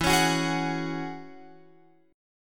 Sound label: E 6th Add 9th, Guitar, Open F; E6add9; strummed; x,11,13,13,11,13